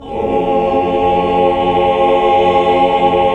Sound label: MIN, CHORDS; Sound & Vision - Gigapack II CD 1 (Roland); HAL Greg.Chords1